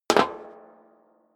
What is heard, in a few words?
step high heels metal rattle single stair up; schritte